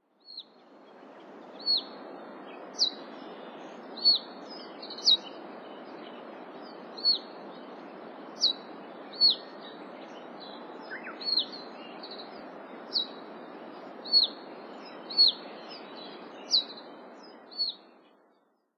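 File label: russet sparrow, nikko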